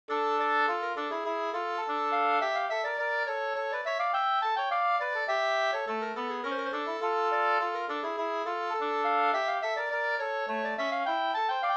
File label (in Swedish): polska, 2st; Bytt-Lasse SvL HG45